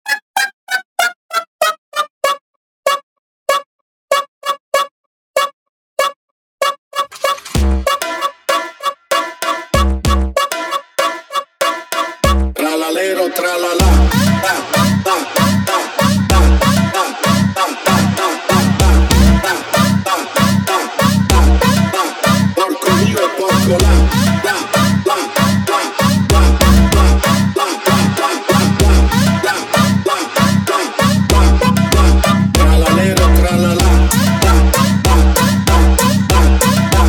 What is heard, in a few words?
Funk super Slowed